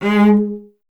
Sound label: STR VC G#1, Cello; Miroslav Vitous; Solo Instruments VOL-1